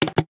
reelstop; Xing Yun Jin Chan

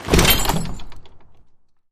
jail opened; Sheriff Of Nottingham

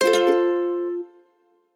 multiline win; Hot Party Deluxe; Wazdan

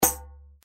reelspin click; Golden Shanghai

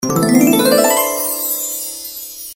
party girl happy mode; Party Girl Ways

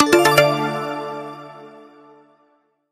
one line win; Sizzling 777; wazdan

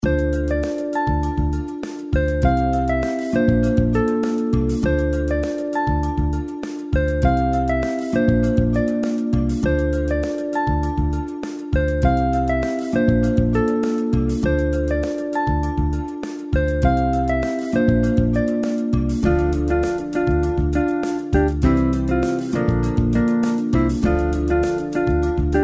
reelspin bg; Stocked Bar

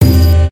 bonus chance; Lucky Coin

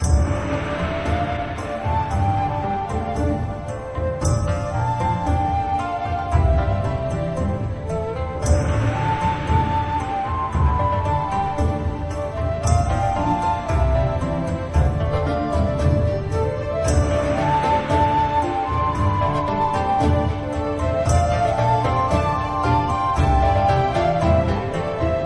bgm; WaterMarginFishing

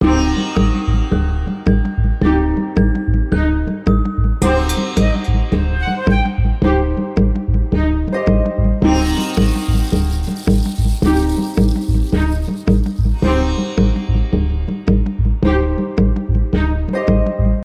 freespin melody; games; Book Of Queen

